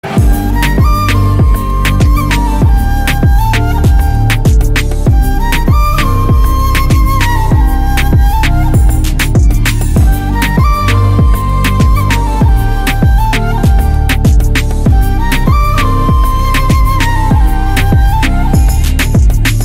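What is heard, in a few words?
background music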